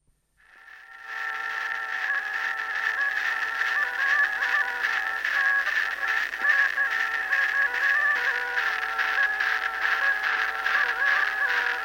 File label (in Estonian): Kupparimuori torupill; Kuusalu; folk; Jakob Ratsov